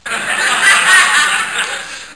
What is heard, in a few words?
Bursts of Laughter; Human